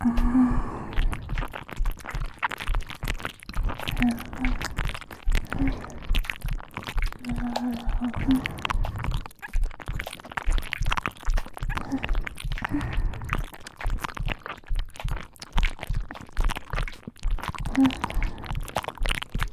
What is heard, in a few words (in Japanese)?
両耳舐め 射精カウント; 抱き着き騎乗位; 監禁S女; ヤンデレJKまゆり